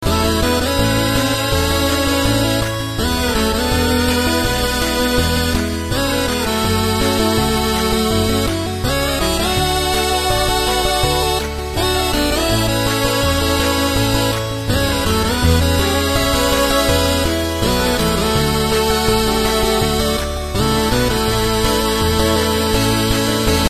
8-bit

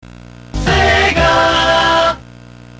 Start-up; Sega

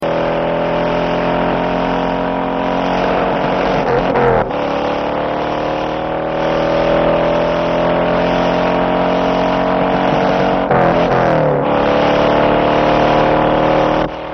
Mustang Bullitt

